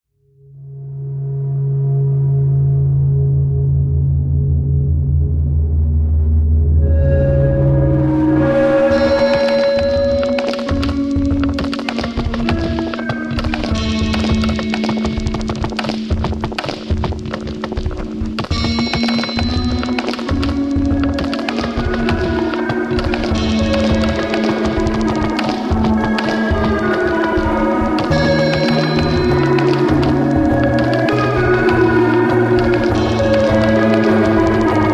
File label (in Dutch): muziek oorspronkelijk bedoeld voor 2 piano